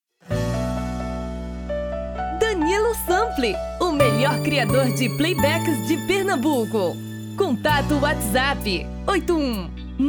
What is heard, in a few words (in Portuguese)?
feminino